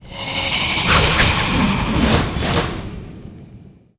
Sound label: ghost blader attack